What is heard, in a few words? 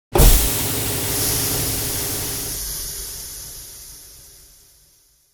Steampunk machinery compressed air release hiss